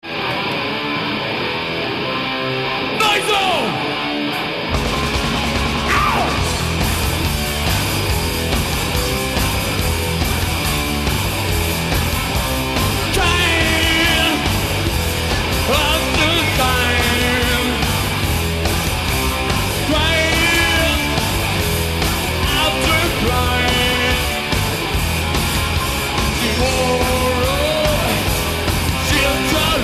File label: techno live